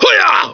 SW YELL

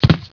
LAND

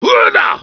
SW YELL

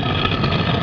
STONE

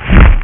thud

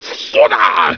SW YELL

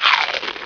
cookie

